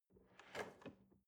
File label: drawer large draw soft